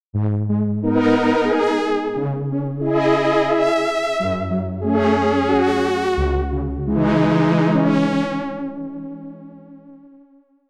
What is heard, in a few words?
opx polymoog